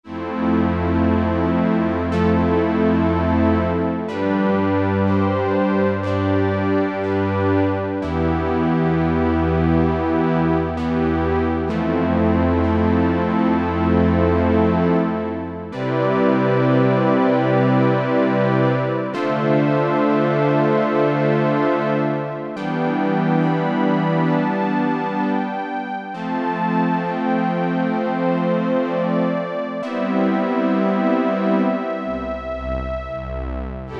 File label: FOUR POLE STRINGS, Oberheim OB-8; Factory Patches